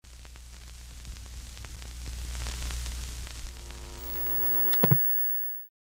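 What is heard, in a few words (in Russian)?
нет сигнала выкл тв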